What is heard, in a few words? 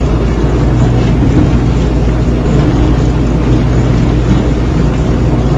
dishwasher; ambience; aomdc